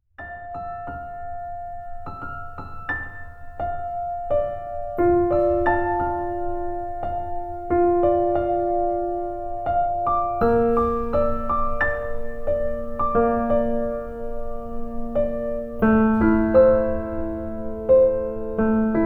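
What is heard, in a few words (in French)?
Zen Meditation Ambiance Musique de fond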